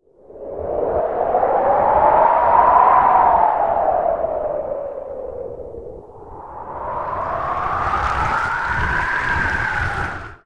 WIND; Best Service - XXL Production Effects; WIND+STRM

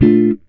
GTR CHORD; Univers Sons; Jazzistic

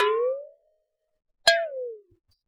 WATERBEL, WATERBELL; Univers Sons - Raricussions

